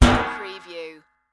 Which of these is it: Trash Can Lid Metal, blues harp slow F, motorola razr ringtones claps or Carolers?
Trash Can Lid Metal